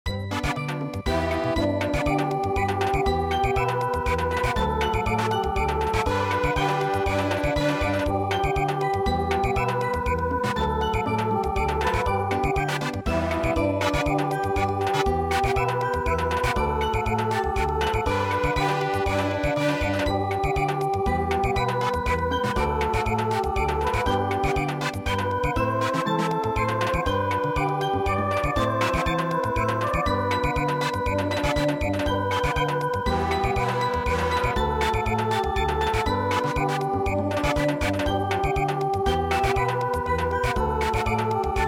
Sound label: German Dance Song Samba